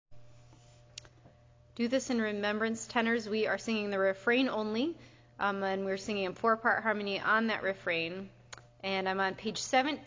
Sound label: Do This in Remembrance tenor